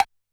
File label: F5 Wood Block L, Yamaha PSR-E323 Standard Kit